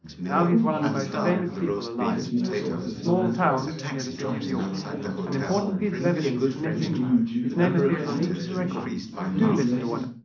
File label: male, 380ms 4cm; SiSEC11; underdetermined